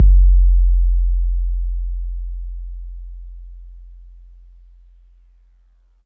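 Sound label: bass drum 808 MPC60 Nice F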